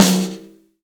large room steel snare drum f sharp key

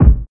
low kickdrum a key

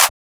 natural clap sound g sharp key; percussion; single hits one shots

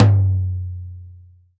High Floor Tom Drum F# Key; tom drum samples; one shots

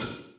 studio pedal hi hat single hit A sharp

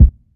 clean kick drum sound c key

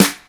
sharp snare sound g key

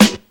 strong bottom end snare drum sound g sharp key

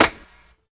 90s small reverb snare sample a key

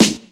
00s rap steel snare drum sound g sharp key

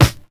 tight lows snare drum sample a sharp key

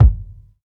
smooth bottom end kick drum one shot f key

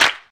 good hand clap d sharp key; single hits one shots

